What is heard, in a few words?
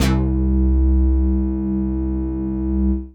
Synth Prophet Bass C; Electronisounds